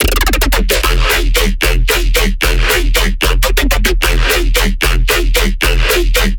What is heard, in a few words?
bass loop wobble 150 E; grandpaswobble; Virtual Riot